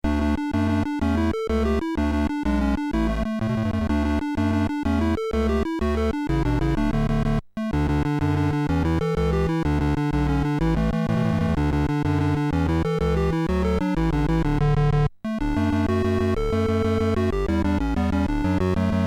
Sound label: horn; mozart